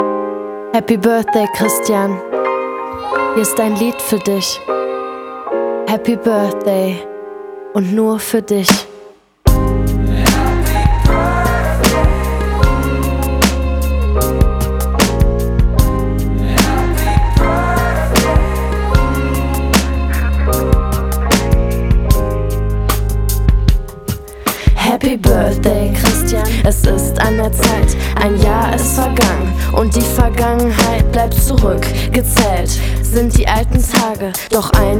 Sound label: Hip Hop Birthday